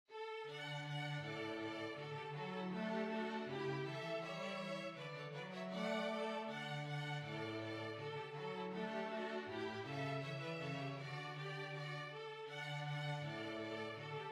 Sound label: Alborg Polka